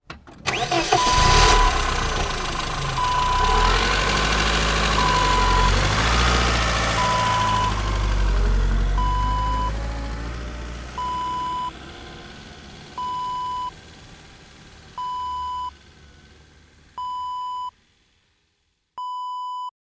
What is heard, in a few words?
car start drive away reverse